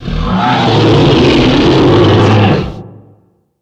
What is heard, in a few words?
roar; slender; garg